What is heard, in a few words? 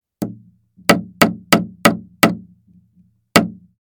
Hammer hitting nail into wood hammering